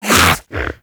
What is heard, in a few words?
Predator Vocal Tranq Dart Grunt; Warframe; Hunting Critters; Characters